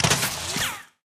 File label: FLAK CANON RICOCHETS